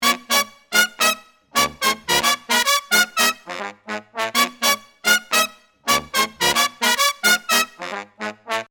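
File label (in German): Bläser unprocessed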